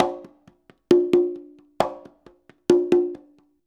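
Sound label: 133.5 CGA, conga; Univers Sons; Basicussions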